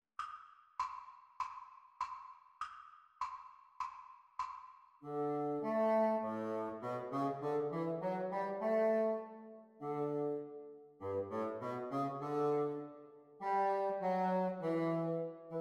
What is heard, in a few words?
Bassoon Duets exam speed